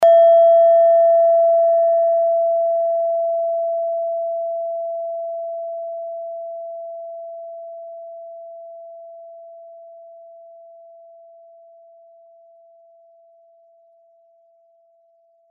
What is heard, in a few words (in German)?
klangschale nepal, Klangschalen Nepal